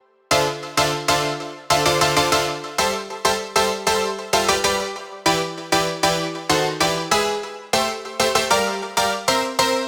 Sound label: chord synth loop